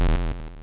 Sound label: open Container